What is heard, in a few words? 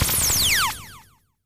bullet